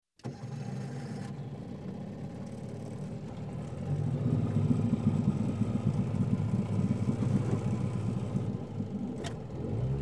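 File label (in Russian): моторная лодка заводится движется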